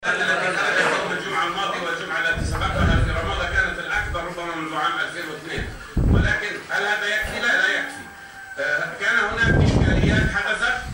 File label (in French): ambiance cafe